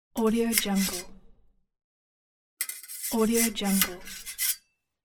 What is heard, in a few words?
scratching metal plates sounds